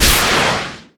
Swingpower Shot

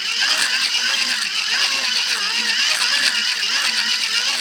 Crank; Slender: The Eight Pages

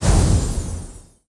Vent Lift; Subway Surfers City; Android, iOS

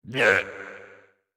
Effort Tiny

